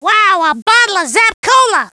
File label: Zap Bottle Pickup; Wow! A Bottle of Zap Cola!; Gruntz